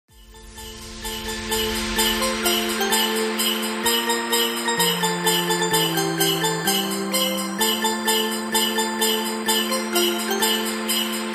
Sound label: Christmas Bounce; Christmas Edit